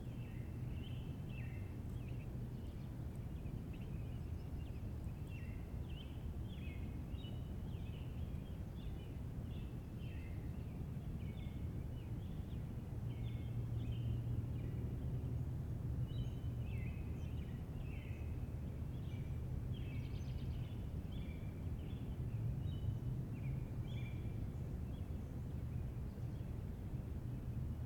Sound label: ambient Outside Day Birds; Zomboid 41.78.16; Project Zomboid